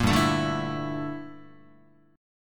G Minor 6th Add 9th G down Guitar; Gm6add9; strummed

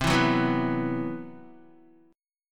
C Major 7th Flat 5th down Guitar; CM7b5 strummed; JGuitar

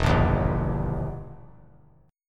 E Minor Major 7th Flat 5th, E, down; strummed; JGuitar

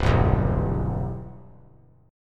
C Major 7th, chord; CM7 strummed; JGuitar

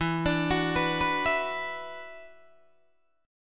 E 7th Suspended 2nd Guitar DADGAD; E7sus2; arpeggiated

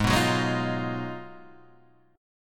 Ab Minor 13th, Ab, Guitar, Standard; Abm13 strummed; JGuitar

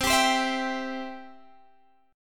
C Major; strummed; x,15,17,17,17,15

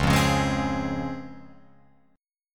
Db Major 7th Db down Guitar Open F; DbM7 strummed; JGuitar

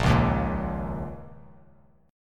Bb Minor Double Flat 5th Bb, Bass Standard; Bbmbb5; strummed; 6,4,1,3; JGuitar

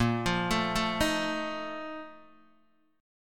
A sharp Major 7th Suspended 4th, Guitar, Open D

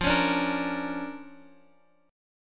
D Minor Major 7th Sharp 5th, F, guitar

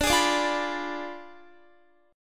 D Diminished 7th D down Guitar; Ddim7 strummed

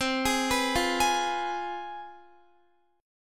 C Major 7th Suspended 4th Sharp 5th C down Guitar; CM7sus4#5; arpeggiated; 4E,4A,5D,5G,5C,6F

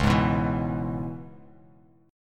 Db-Minor 7th-Db-9,11,9,9-down, Dbm7 chord; strummed; JGuitar

